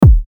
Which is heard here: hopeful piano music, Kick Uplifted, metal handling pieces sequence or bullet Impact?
Kick Uplifted